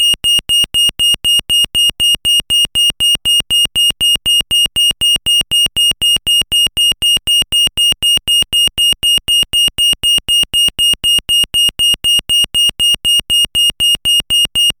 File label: euro stutter intermittent beep; DP3000